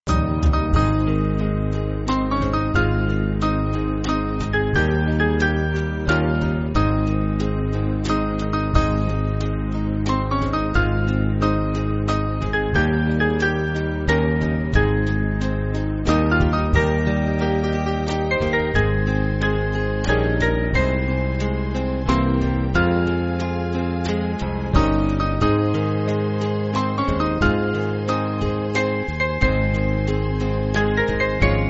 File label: Band; Though Your Sins